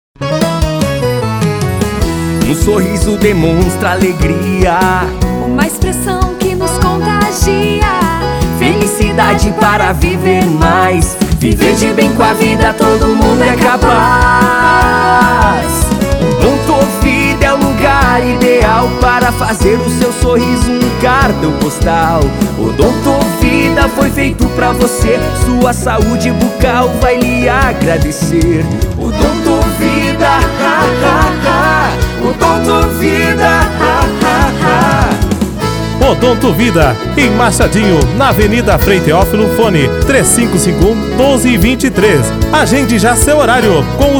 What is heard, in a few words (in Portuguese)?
Jingle; Odontovida